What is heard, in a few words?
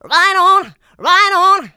voc, female vox, Vocal House